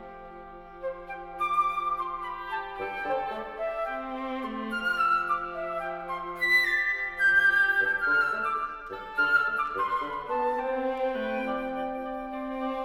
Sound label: divertimento, pastorale, orchestra